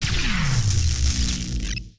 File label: spawn; gauntlet